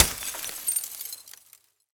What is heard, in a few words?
Impact on Windshield with Debris; Fullmix; Red Libraries; The Windshield